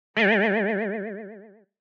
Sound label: funny sound effect for jack in the box, surprise